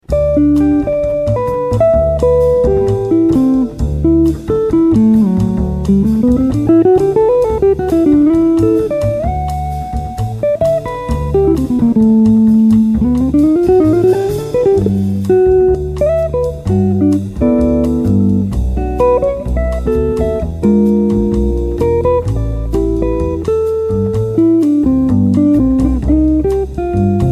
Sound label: Jensen Alnico P12N plus Ibanez as-200